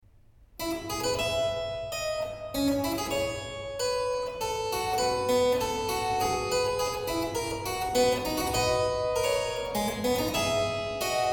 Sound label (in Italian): fuga, harpsichord & organ; Fuga sesta; Georg Philipp Telemann; Fugues, Overtures, Preludes and Suites